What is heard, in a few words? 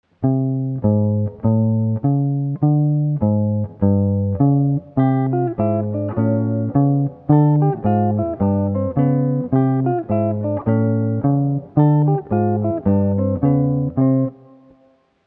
S Guitar Bass